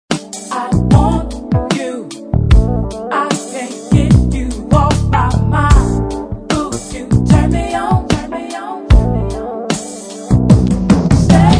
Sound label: Jazz Sound